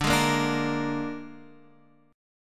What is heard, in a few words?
Suspended 2nd Flat 5th; x,5,6,3,3,6; Gsharp